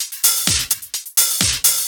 Electro Loop 128BPM; Vengeance Samples

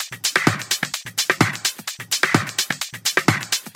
House Loop, 128 BPM; Vengeance Samples

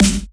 Snare; Vengeance Samples

